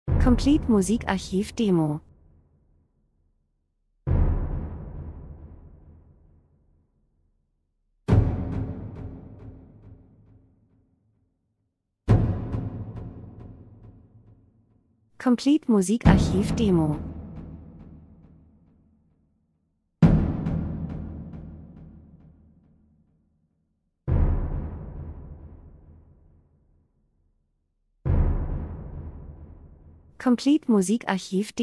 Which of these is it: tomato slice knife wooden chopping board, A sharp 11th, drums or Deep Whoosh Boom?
drums